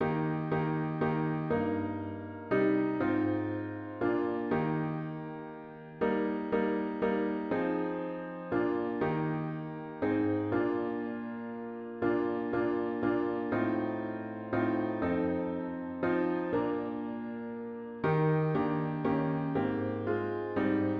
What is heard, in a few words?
piano only; Jesus Thou Joy of Loving Hearts